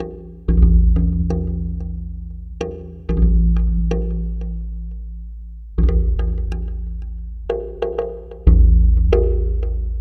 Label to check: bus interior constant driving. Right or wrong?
Wrong